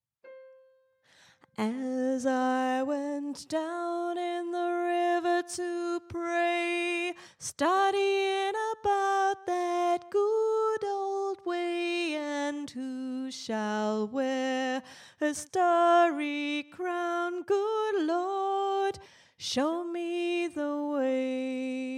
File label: Soprano, gospel choir; down in the river to pray